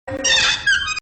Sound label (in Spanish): rechinido; multimedios